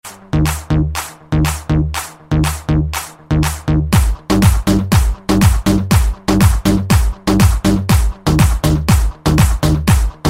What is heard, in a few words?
dance ringtone; samsung iphone